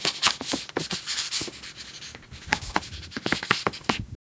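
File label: book rustling chalk scribbling, classroom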